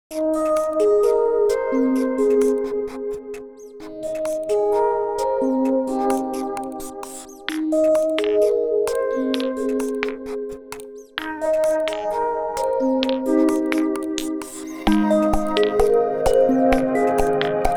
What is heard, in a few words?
Stones, Alarm Clock; Nokia; Nokia Design Team; Alarm Clock Retrospective